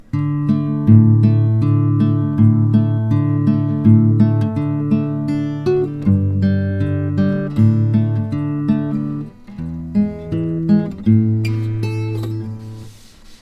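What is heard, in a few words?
jingle bell arpeggio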